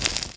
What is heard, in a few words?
Guide Fire Light; Playable Tutorial Level